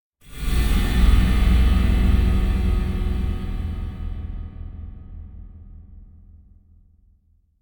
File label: Metallic Impact Occult Finale; Hollywood Edge; sound designer's toolkit v2